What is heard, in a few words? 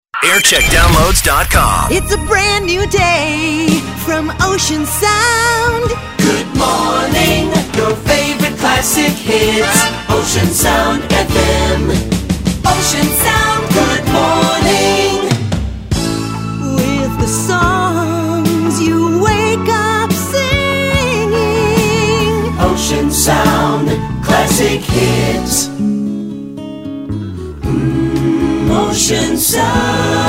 jingles; ocean